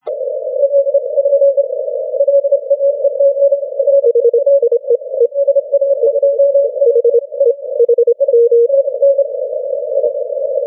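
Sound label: 40m continuous wave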